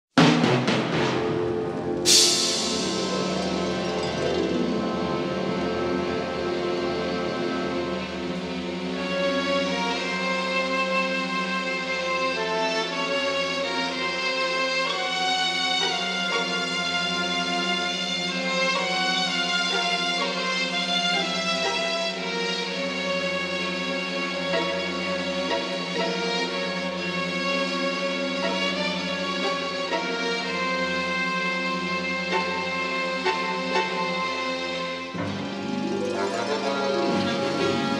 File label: Clean Silent Orch Old Horror; the movies 2005